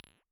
Bottle Struck

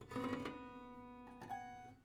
Prepared Piano

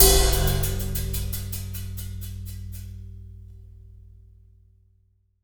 Cymbal; Percussion Samples

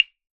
Chinese Temple Block; Percussion Samples

